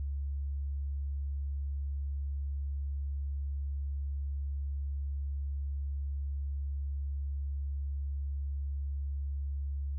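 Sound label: Sine 70Hz Right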